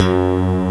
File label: slap bass low; Inside Mac Games; X-Mas Lemmings Demo; Music